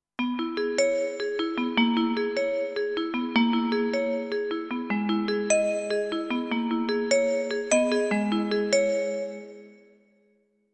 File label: Hard Vibes